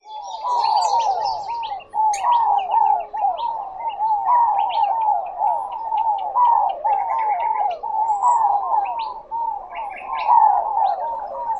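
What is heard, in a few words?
captives calling, bird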